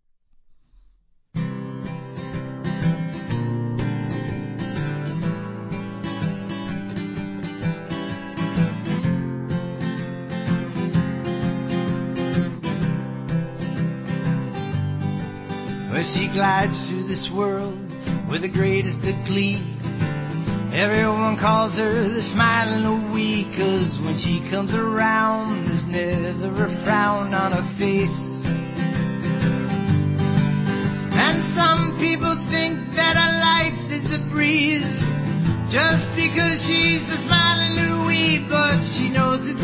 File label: Smiling Louie Acoustic